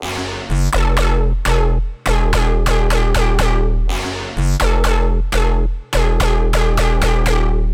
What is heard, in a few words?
G House Drop Loop 124 BPM F# Min, Synths; Loops; Cymatics; EDM Starter Pack